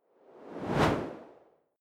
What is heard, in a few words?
whoosh simple